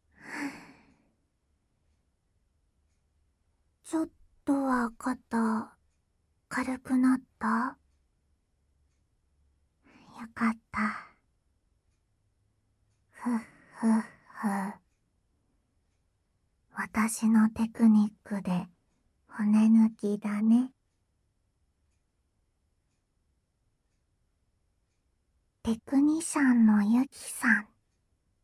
あまがみ耳舐め, ASMR; ユキさんを怒らせると怖い

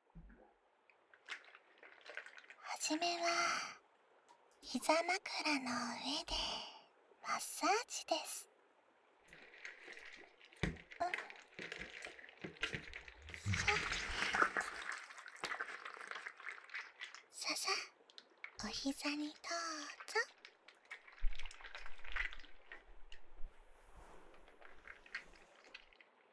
膝枕でマッサージ, ASMR

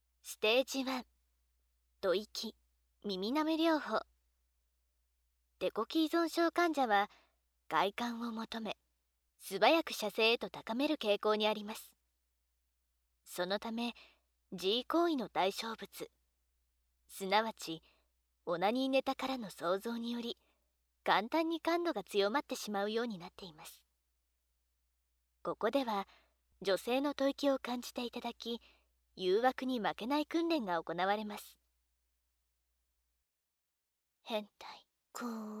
吐息・耳舐め療法; 気持ちのコントロール

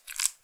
くちゅ音単発

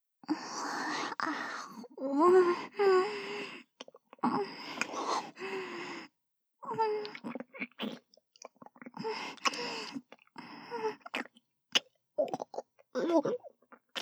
ディープキス; 本番

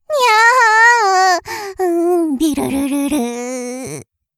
目を回す

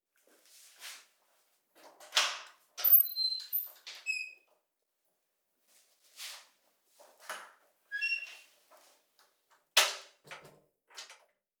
ラブホのドアを開けて入る; 動作系

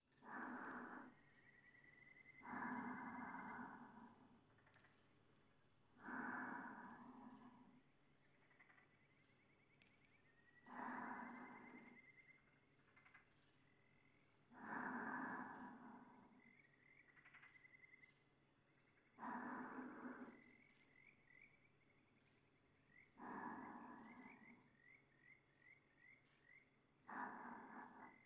寝息（左側）

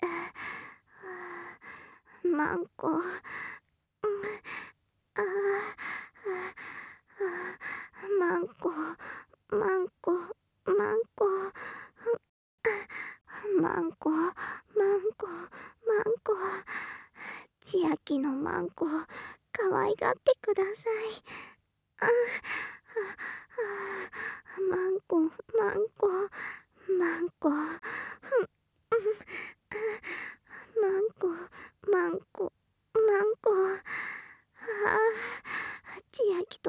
喘ぎ

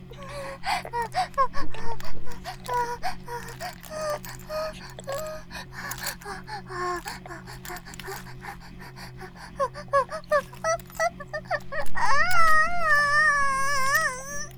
バイブ放置; イクたびにわけて

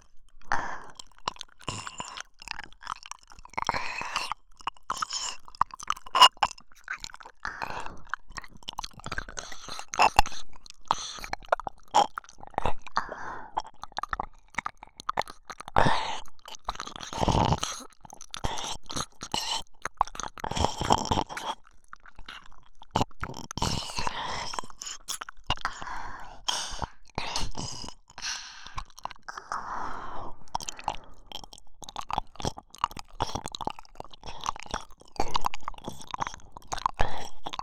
両耳舐め

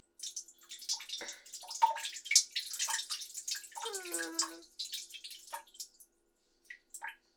おしっこ トイレ バイノーラル; おしっこのみと編集版

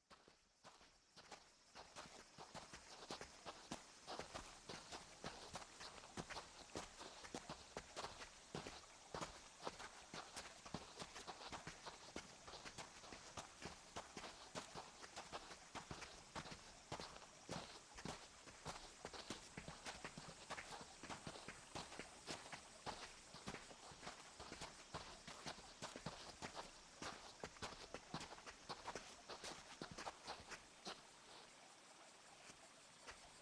お休み処で両耳オイルマッサージ, ASMR

